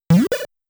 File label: Door Open